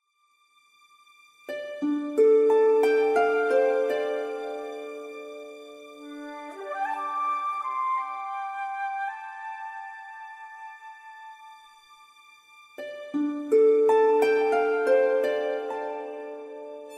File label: Atmosphere; Life is Feudal: Forest Village